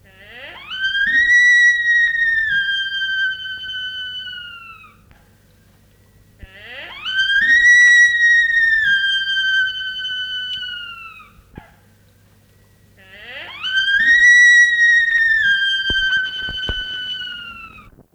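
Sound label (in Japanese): ezo shika, エゾシカ 鳴き声; 発情期になると、遠くに届く鳴き声をあげ、他のオスを追いはらいます。; 偶蹄類; 非発情期のオスはほとんど鳴きませんが、発情期になると、遠くに届く鳴き声をあげ、他のオスを追いはらいます。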